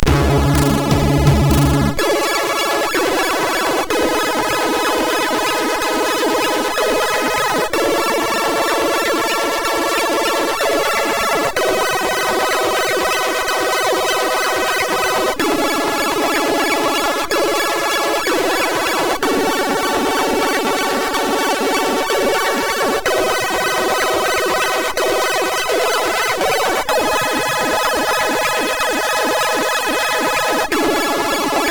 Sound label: Hawkeye loader, MOS6581R4